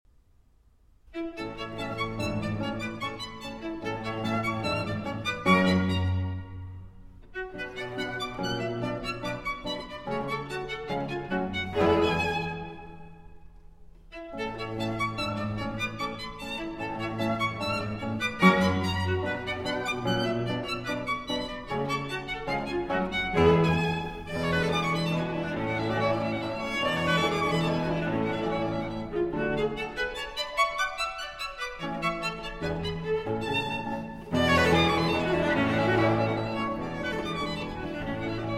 Quartet in A Minor, Minuetto a Canone, Andantino

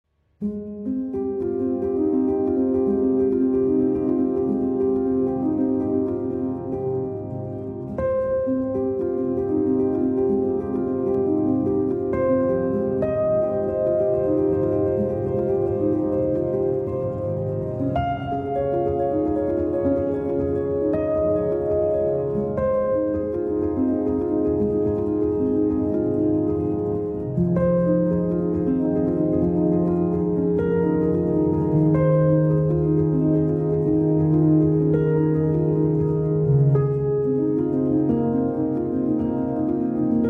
Solo Piano

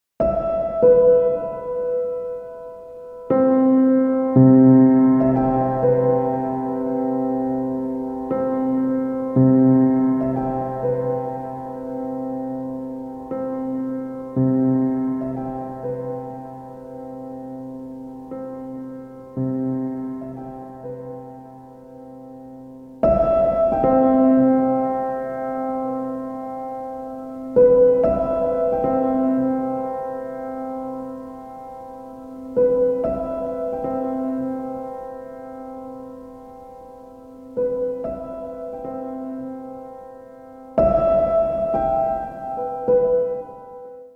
Piano Sounds

